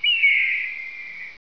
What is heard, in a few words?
bird